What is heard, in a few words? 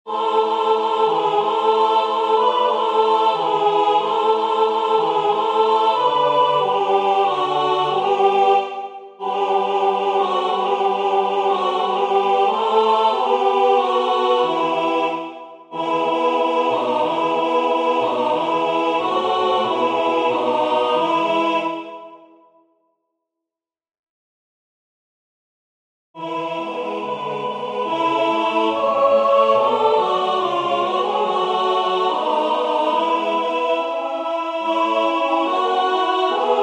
Slavikovsky Polecko Maly Alt; A.Dvorak